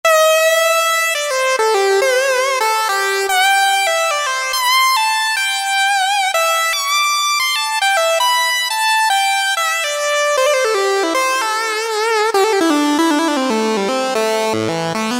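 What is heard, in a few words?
Lead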